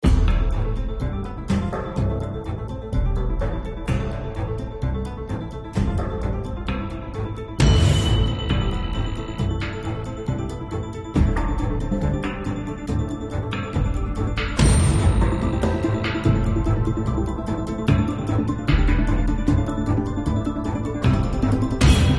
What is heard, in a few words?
Action